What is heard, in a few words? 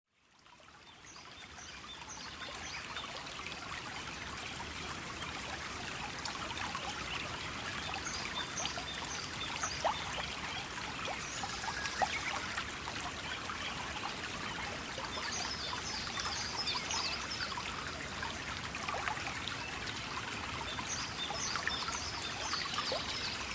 water